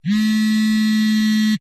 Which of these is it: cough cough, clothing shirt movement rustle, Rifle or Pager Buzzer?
Pager Buzzer